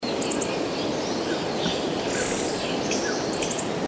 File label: Flamecrest